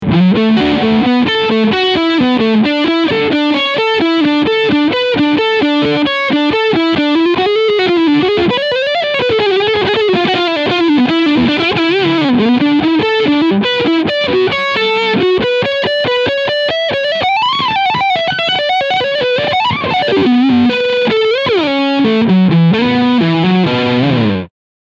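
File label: Front Pickup Leadsound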